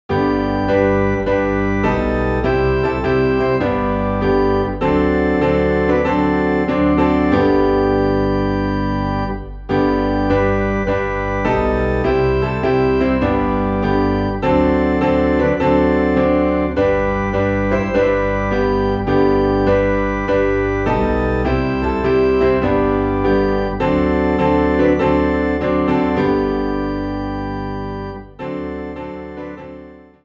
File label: Piano and Organ in G; Tell It to Jesus